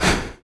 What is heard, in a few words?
Body Perc, Human Perc; Spectrasonics; Supreme Beats; World Dance